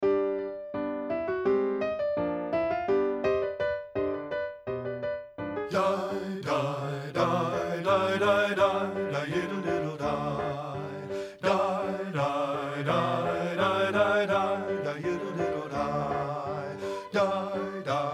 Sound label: bass; satb